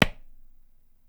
tabla; Dirt-Samples

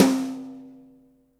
snare slack, gretsch; Dirt-Samples

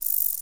robust shieldback, insect

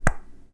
hand mono

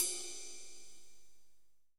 ride

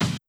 break kick

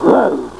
wolfman; monsterb

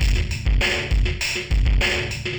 ind dist

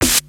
noisey snare

